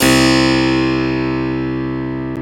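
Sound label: Harpsichord; CMI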